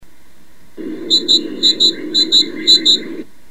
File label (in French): mesange charbonniere, oiseaux